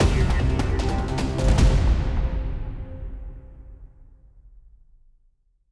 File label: boss end